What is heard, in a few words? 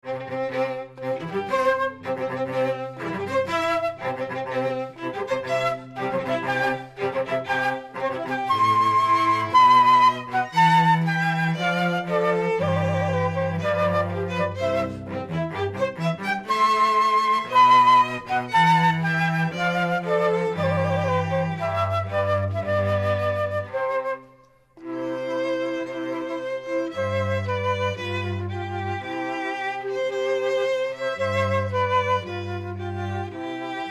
Wedding March, Flute Violin And Cello Trio; Mendelssohn